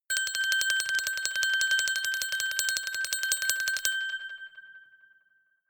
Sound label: glockenspiel sparkle tremolo high